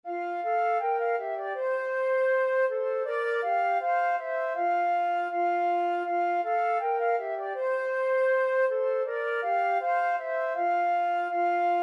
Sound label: ding dong, flute duet